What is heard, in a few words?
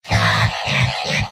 heavy laughter big; mvm